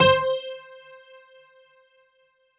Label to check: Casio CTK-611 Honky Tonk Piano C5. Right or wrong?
Right